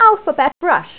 brush

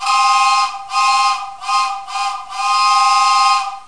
Train Whistle; Play&LearnRC

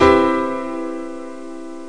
dynamic major 7

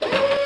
pt strt, movers plats; Quake III Arena; deserted-q3

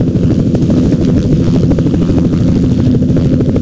HELI; vehicles; PC Gamer IT CD 40

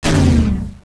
magic; duke nukem total meltdown

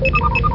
computer; Explorapedia; KidsRoom

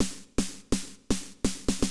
SNARE HOUSE 125; eJay

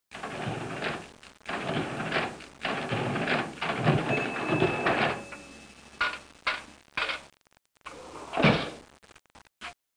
cash; ambient; shopping

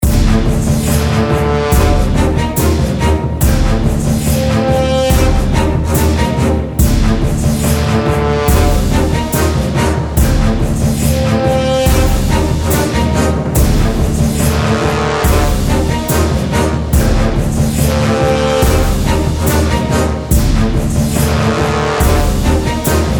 Battle; kult

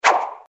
Ice Ball Throwed; bob espo

